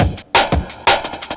break, breakcore

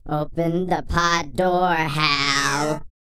PITCH MOD, Vocal, SCI-FI; 5 SCI-FI CHZ

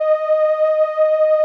SOLINA, String Machines

